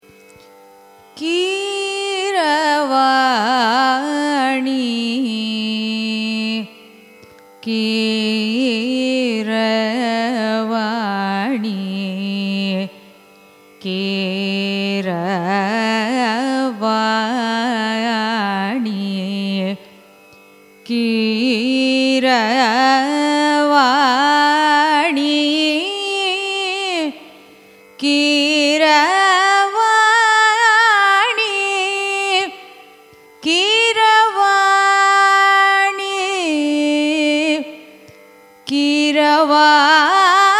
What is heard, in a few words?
raga keeravani signature, carnatic music